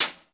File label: JAZZ S, JAZZ KITS; Crypton; DrumBase5000